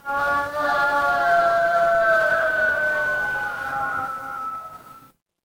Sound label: crowd applause